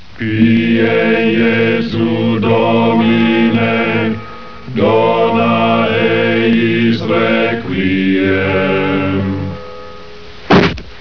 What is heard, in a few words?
monty python chant